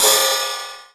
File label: Ride, Yamaha SY22; Drums